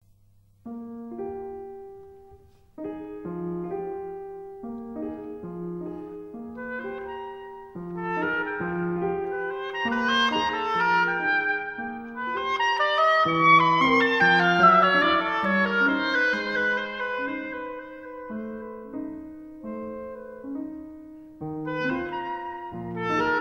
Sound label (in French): Temporal variations hautbois et piano Waltz Allegretto rubato; Benjamin BRITTEN